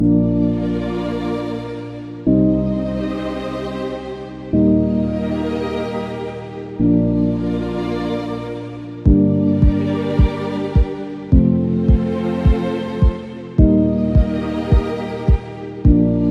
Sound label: Building Soundscape, corporate music; corporate music vol 20